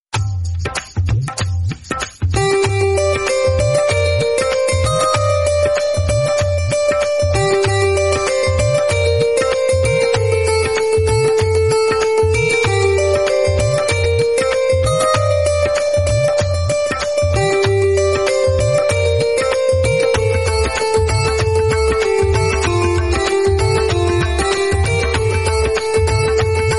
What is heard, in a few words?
Ringtone Tabla